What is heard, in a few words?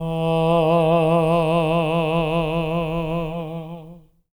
TENOR E3, Voices; Spectrasonics; Symphony of Voices